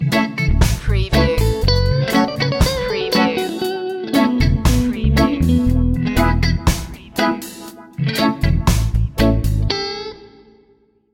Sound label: jamaica feeling stinger